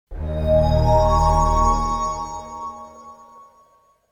Shutdown, Shutdown, Computer: Short Musical Style Phrase; Miscellaneous Musical; Sound Effects:Musical; Stuart Duffield